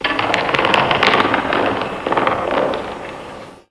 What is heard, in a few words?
creak